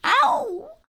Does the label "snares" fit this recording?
No